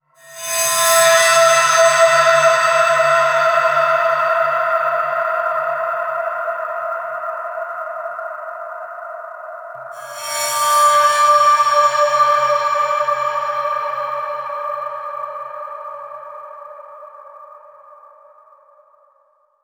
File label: environment stinger